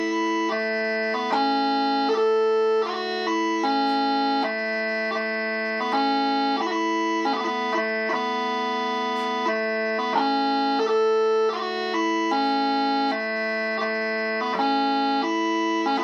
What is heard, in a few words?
Battle’s O’er, bagpipe tunes; celebration of life